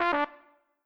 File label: Instr Trumpet; backpack hero gamerip 2022